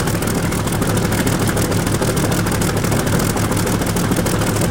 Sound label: bomber side, engines; War Thunder